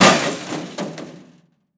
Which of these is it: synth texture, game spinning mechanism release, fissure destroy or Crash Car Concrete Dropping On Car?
Crash Car Concrete Dropping On Car